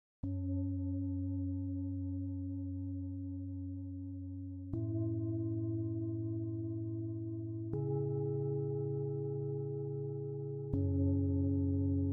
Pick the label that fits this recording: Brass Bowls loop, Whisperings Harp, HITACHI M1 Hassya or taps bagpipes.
Brass Bowls loop